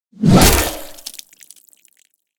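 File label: gore weapon shovel whoosh impact gore; sonniss gdc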